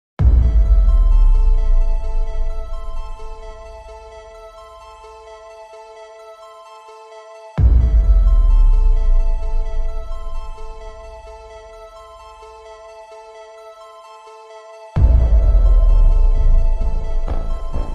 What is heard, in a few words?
Synth Braams and SFX Only